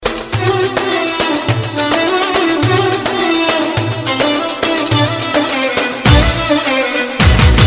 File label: arabic violin; classical